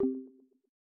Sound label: reconnect; codepill-sfu